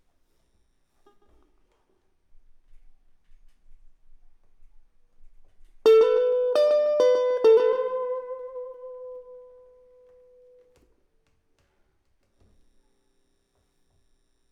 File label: Elect Guitar